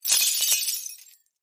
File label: Glass Debris